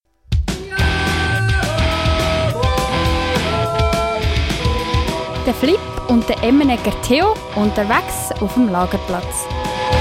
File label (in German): mit Jingle